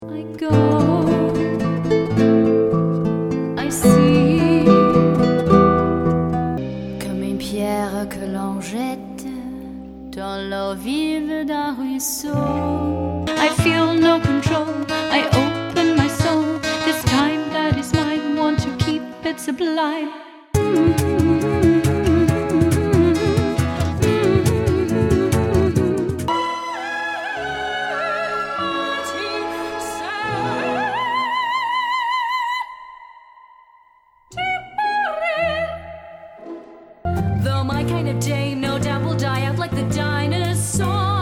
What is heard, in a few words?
singing